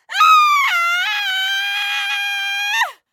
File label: female scream; sonniss gdc